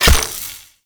Rain Of Arrows Impact; Warframe; Duviri; Dax Archer; Abilities

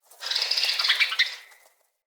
Flock Attack Dive; Powers; Fey

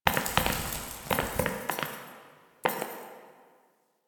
Idle Head Shake Vigorous; Ambience; Warframe; Entrati; Tagfer

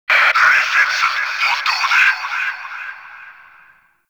Exterior Notification, Fortress Ambience; Warframe; Grineer

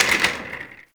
Office Chair Impact

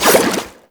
Aqueous Discs Hit; Warframe; Yareli

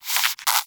Rodent Vocal Snore

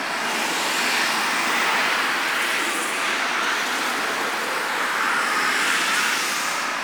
Hover Loop; Tengu; Warframe

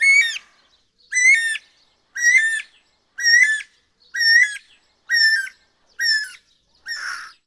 Forest Bird Night; Ambience; Warframe; Grineer Forest

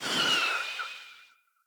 Bow Arrow By; Weapons; Josalfar Bow

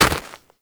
Walk Snow; footsteps; Warframe; Operator

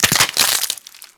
Infested Maggot Cyst Break; Abilities

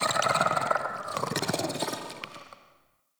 Create Vocals; Liset Alchemy Lab

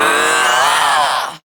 Throw, Barks; Kuva Lich; Persona C; Ability

